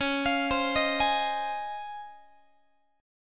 Db Major 9th, Guitar; arpeggiated; JGuitar

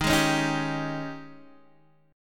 Ab Major 7th Suspended 4th, chord

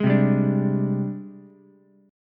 G sharp Major Sharp 11th, Ukulele C6; G#M#11 strummed; 1,0,3,5; JGuitar

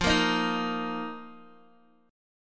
F 7th Suspended 4th F down Guitar Open D

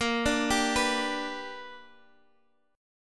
G Major Bb; G/Bb arpeggiated

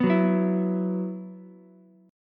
E Suspended 2nd, Ukulele; Esus2 strummed; 4,6,7,7

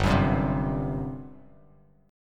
G Major 7th Suspended 4th, G, down, Bass Standard; GM7sus4 strummed

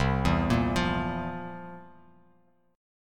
C 11th, C, down, Bass Standard; C11 arpeggiated

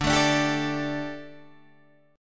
D Suspended 4th

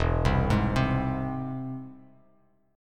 E Augmented, Bass Standard; E+ arpeggiated; JGuitar

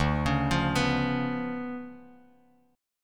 D Minor Major 13th, down, Bass Standard; DmM13 arpeggiated; JGuitar

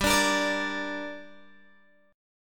G Minor G down Guitar Open G, chord

